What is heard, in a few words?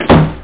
W DOOR